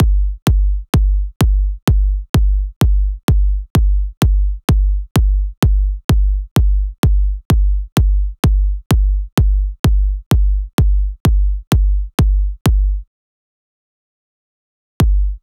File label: 128 BPM Kick; Vengeance - Essential Clubsounds Vol.5; Old College Kit Root G; Drums & Perc